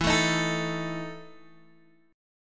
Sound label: E Major 7th Suspended 4th, Guitar, Open D; chord shape